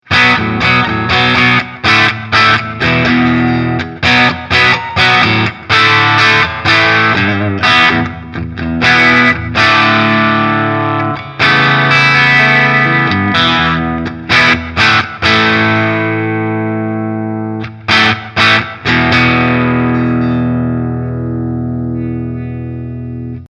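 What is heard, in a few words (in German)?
High Treble Normal Strat Steg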